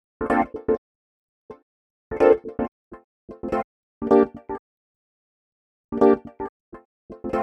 126 G D MUSIC; funky; Loopmasters; Funky Underground Vol 2

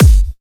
Bassdrum

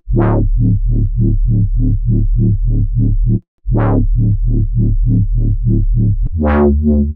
Bpm134 Busy Bass Loop D, Dubstep; Prime Loops; Dubstep Illusions

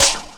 Perks; Drum Hits; Loopmasters; Jon Carter & Alex Blanco; Block Party Essentials

